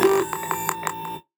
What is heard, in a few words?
DEFENCE BOT idle; Defensebot